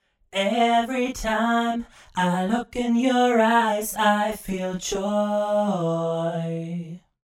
Vocals Duet Simple Double; Antares

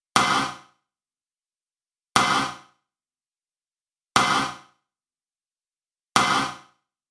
Sweep Sidestick

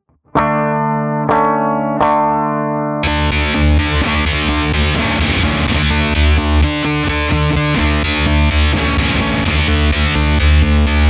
Rise n Shine Magenta Mode Step; Stone Deaf

